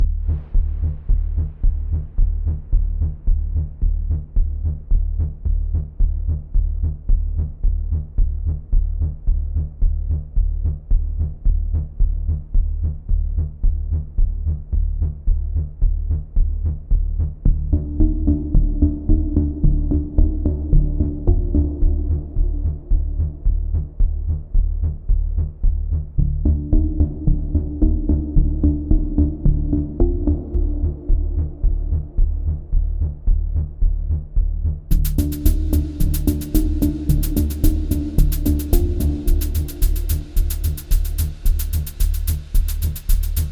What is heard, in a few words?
background music